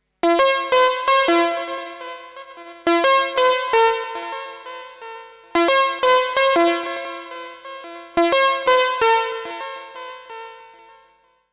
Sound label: Behringer CAT Sync